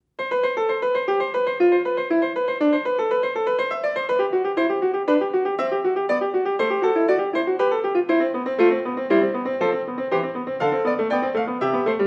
Fugue in C; Bach

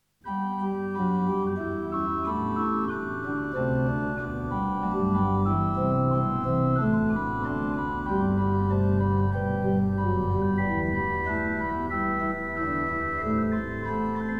organ; BWV 643; Alle Menschen müssen sterben; Orgelbüchlein; BACH ORGAN; Organ Works; CHORAL PRELUDES

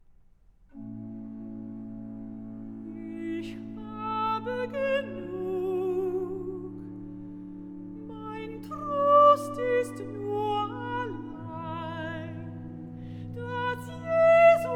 Recitative, cantatas; BACH; Ich habe genug

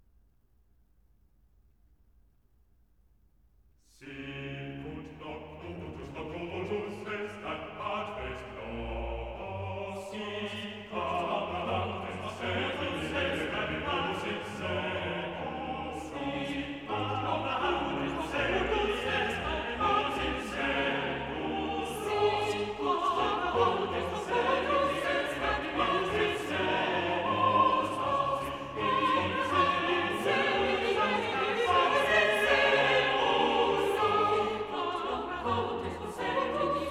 Sicut Locutus Es; BACH; Magnificat

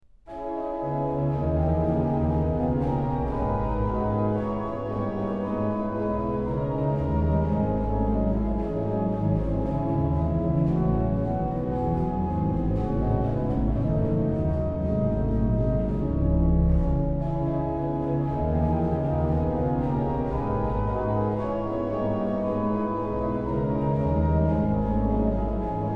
ORGAN, Chorale Preludes; BWV0625; Christ lag in Todesbanden; BACH; BACH ORGAN WORKS

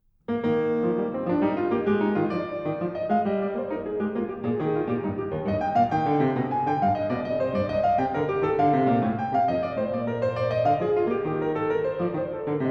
Courante, E Flat, klavier; French Suite #4; BWV 815; BACH

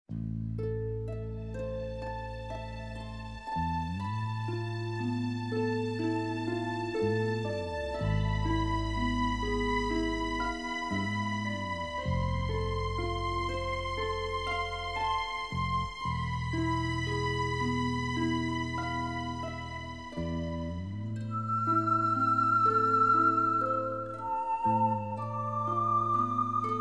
Pan flute music

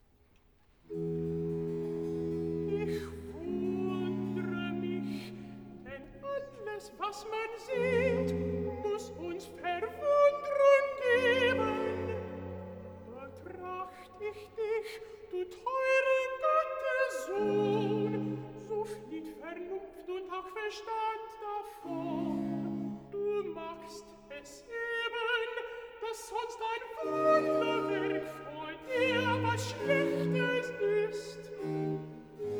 Recitativo (Alt), BACH for ALTO; BWV035; Ich wundre mich

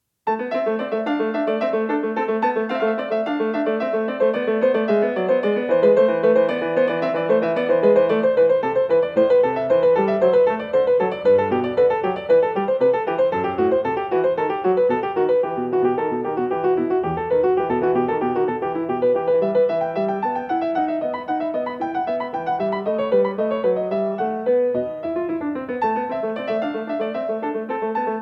Bourrée; English Suite No. 2 in A minor; BWV 807; BACH; ENGLISH SUITES

